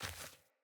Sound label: Sandals Walk Dirt, Footsteps